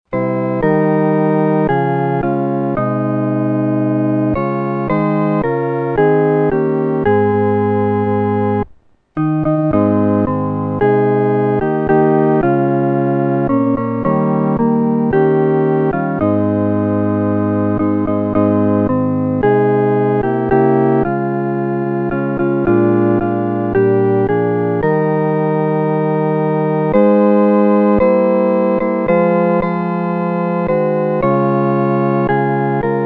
合奏（四声部）

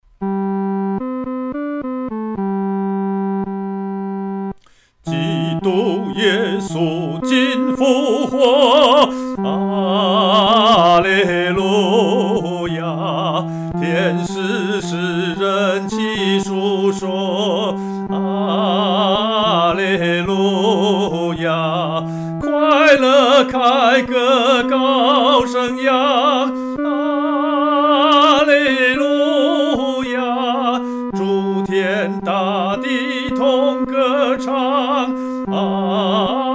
独唱 第三声; 基督今复活

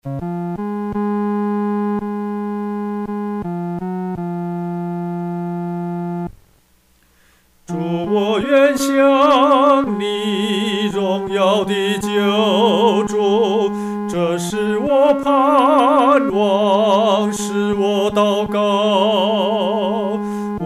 独唱 第三声; 主，我愿像你

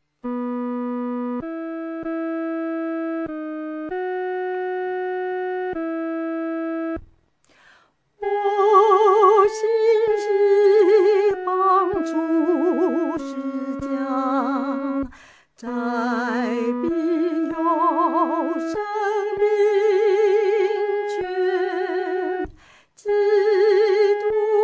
近主十架 独唱（第一声）

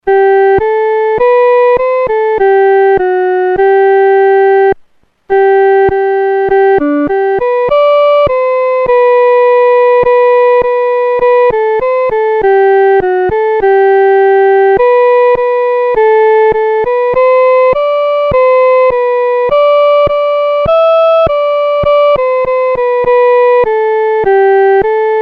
独奏 第一声

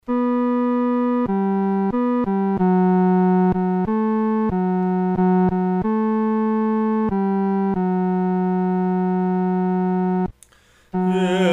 独唱 第三声

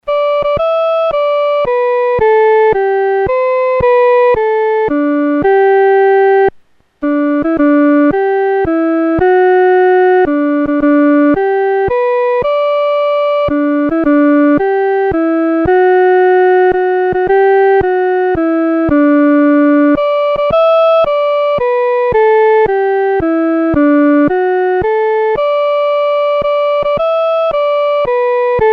独奏 第一声

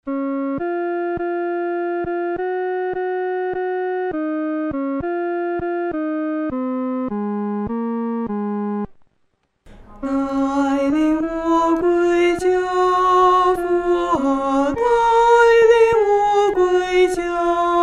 独唱 第二声; 带领我归家

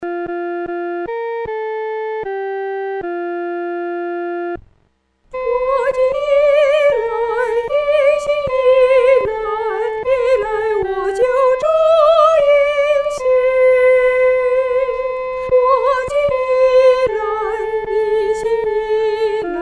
独唱（第一声）

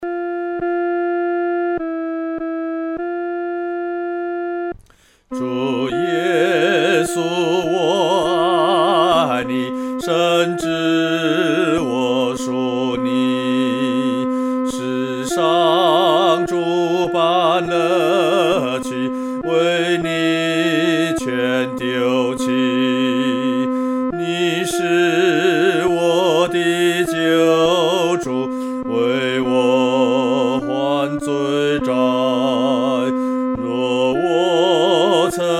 独唱 第二声

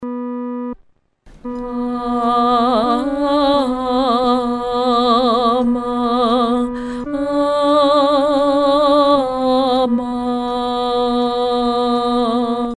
阿门颂 独唱（第二声）; 阿门颂（一）

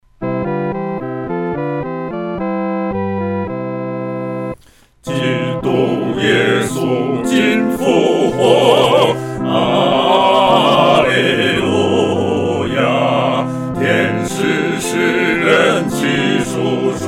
合唱 四声部; 基督今复活

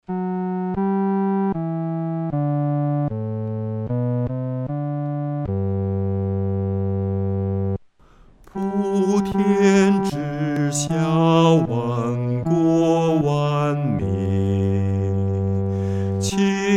独唱 第四声

